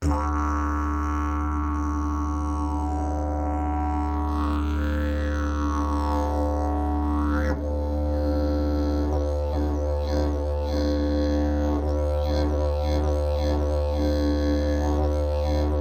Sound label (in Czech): jasan, didgeridoo; klokan; 32 mm; Ladění : D; Tomáš Dufek